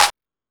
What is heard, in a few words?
Techno, EMU XL-7